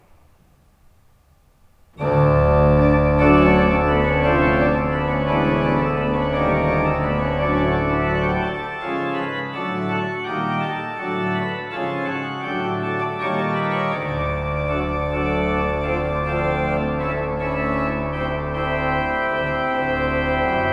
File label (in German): Vorspiel; EG 100 Wir wollen alle